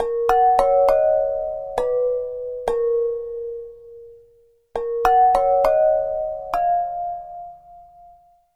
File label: Flower Pot